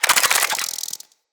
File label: Infested Ashen Move Start